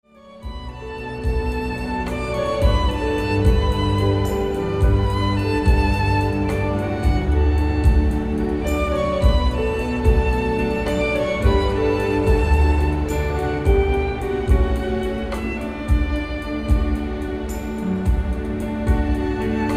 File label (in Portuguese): Piano e Cordas